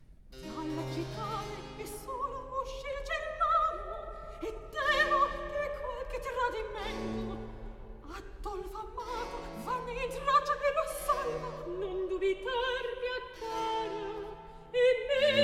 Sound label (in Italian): Recitativo; Dalla cittade; Handel Masterworks; Act I